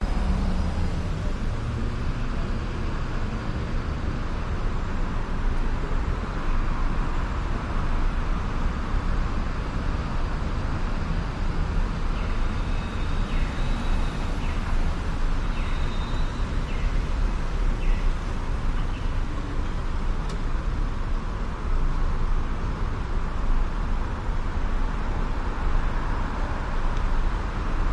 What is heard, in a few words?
vancouver night traffic roaring traffic cars engines honking driving; nelson st; howe st; pedestrians; your free sounds